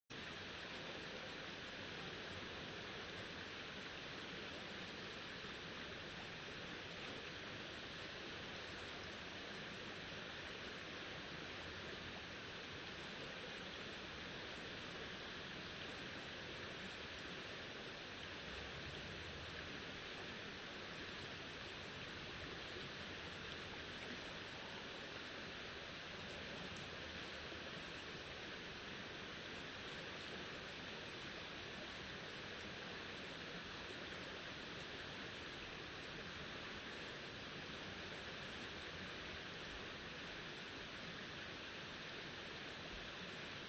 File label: riverside; Kyoto U; Ashiu